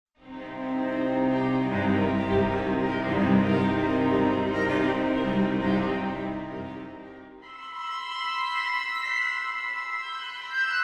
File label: string ensemble drops; NI arkhis